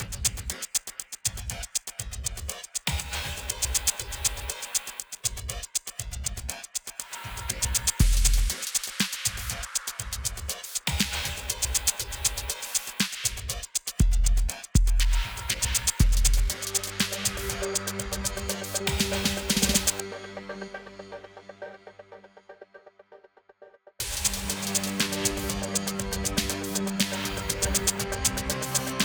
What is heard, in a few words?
Rock